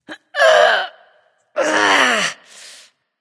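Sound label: grunt lift, female; smite; voicepack; hera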